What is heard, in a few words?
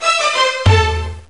Egypt Lose; Mystery Tales: Time Travel; NDS